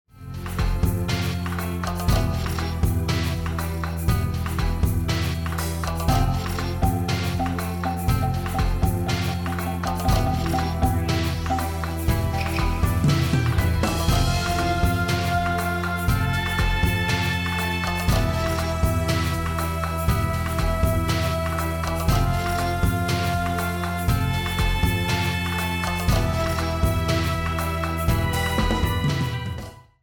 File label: bomb planted; music kits custom